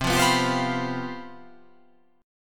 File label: C Major 7th Suspended 4th, down